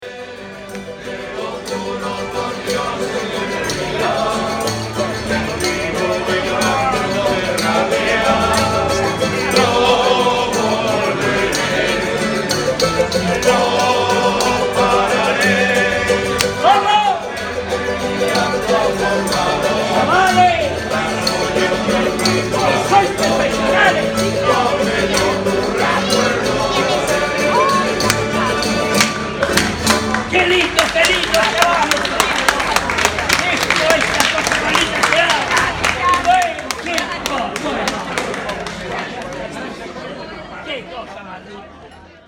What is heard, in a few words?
Men Singing in Santiago de Compostela